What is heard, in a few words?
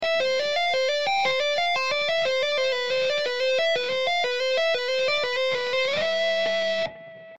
Overdrive Tapping